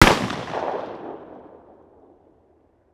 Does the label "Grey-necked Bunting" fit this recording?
No